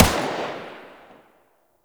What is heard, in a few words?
Shot, Rifle; Warframe; Eidolon; Rifle Lancer